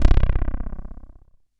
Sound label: JUNO BASS